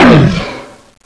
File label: zombie hurt; aksha zombie